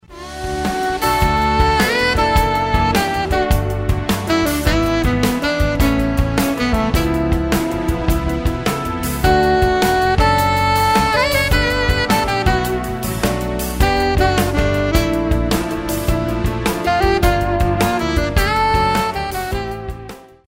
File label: Smooth Jazz